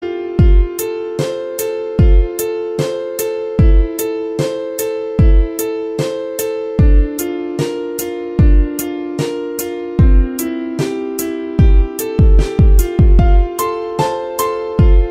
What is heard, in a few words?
Inst